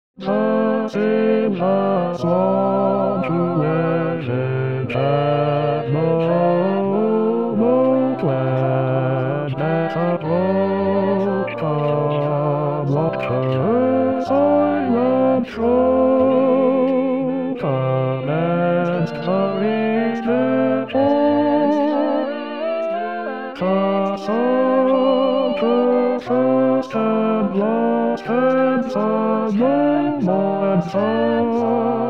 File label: Bas; Silver Swan